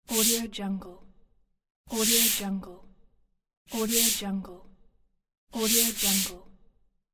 paper slide

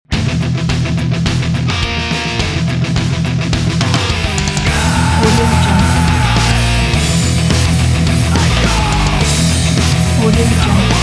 death metal theme ident

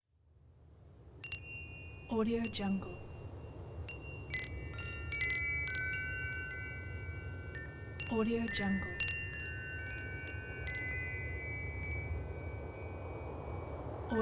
light wind chimes

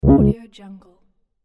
interface error sfx

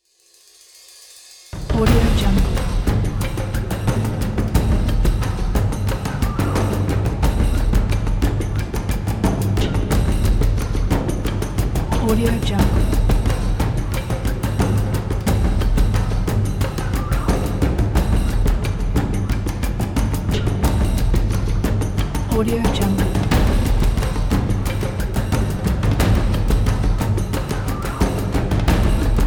surplus percussion cluster

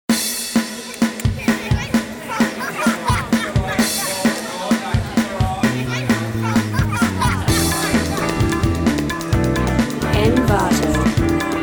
motown brass

